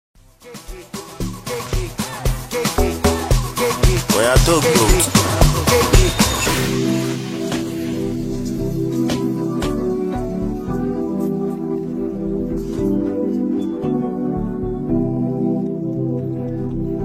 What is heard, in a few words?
OPEN VERSE Instrumental BEAT HOOK; Countries: Nigeria